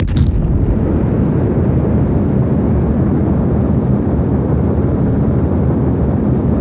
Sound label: Hover, thrusters; 16bit